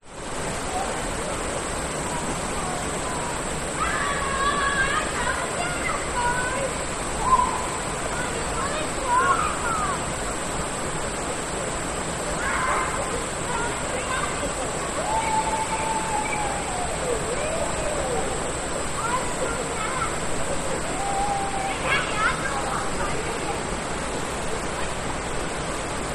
nature forest ambience children play in creek slight distance